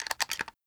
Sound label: Shotgun Load Shell; Foley; DoomRTX